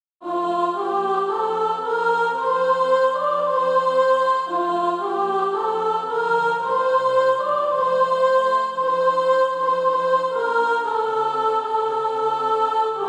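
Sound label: soprano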